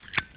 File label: click